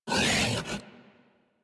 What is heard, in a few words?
Feral Vocal Melee Attack; Warframe; Kubrow